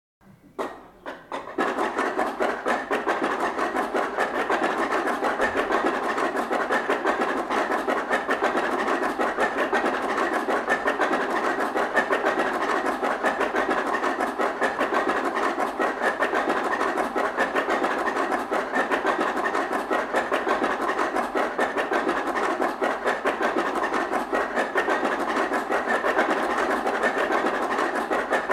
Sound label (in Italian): matracca strumento musicale che sostituisce le campane durante la settimana santa